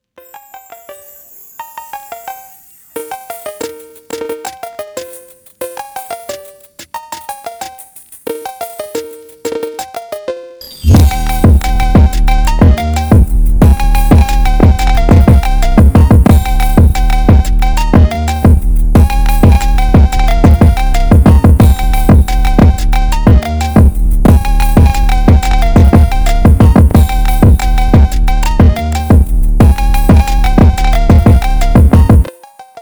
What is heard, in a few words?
Phonk Drift New Year remix, Drift Phonk